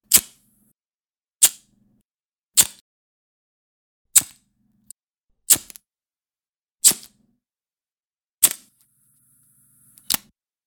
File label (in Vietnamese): Âm thanh bật lửa nhựa